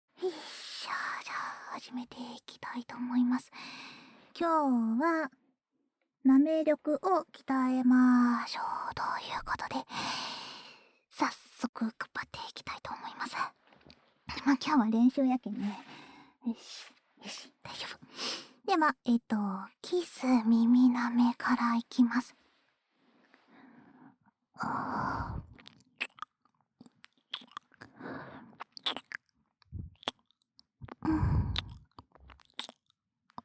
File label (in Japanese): 耳舐め練習, ASMR